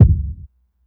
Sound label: fluffy kick one shot d sharp key; kick drum